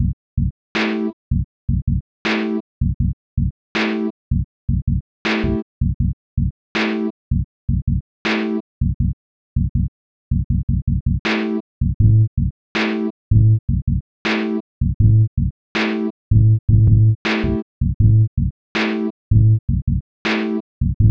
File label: Single Sinewave Project